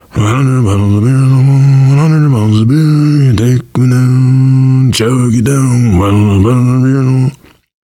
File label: nervous humming, survivor voice, biker